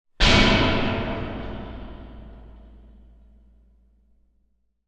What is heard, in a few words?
sound design big horror hit metallic cinematic